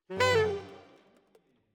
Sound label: Alto, RNR for piano